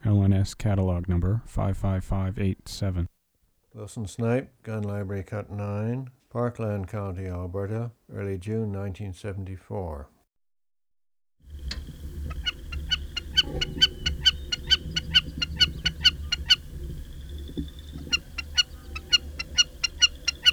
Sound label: Wilsons Snipe chip call